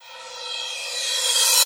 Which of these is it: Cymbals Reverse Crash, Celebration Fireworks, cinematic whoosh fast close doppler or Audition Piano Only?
Cymbals Reverse Crash